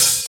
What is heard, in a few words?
clean pedal hi hat sample e key